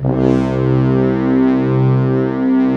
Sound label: HUM BRASS C2, Analog Fantasies; USB Soundscan vol.49 - Analog Fantasies; AKAI